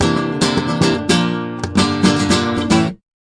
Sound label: bright flamenco guitar riff; REMIXABLE COLLECTIONS